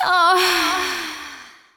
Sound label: female orgasm